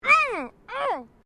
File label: FEMALE GRUNT; Female vocal sounds; Grunts (Sound Effects:Voice)